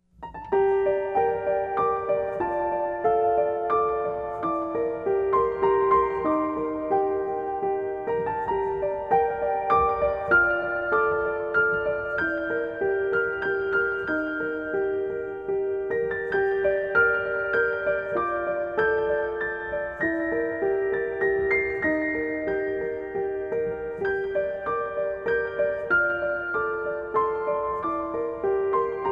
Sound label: Piano Rain